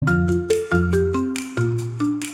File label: spin